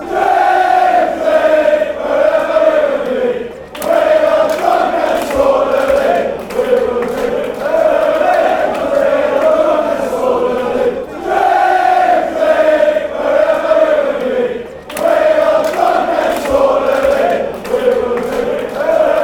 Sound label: newcastle chant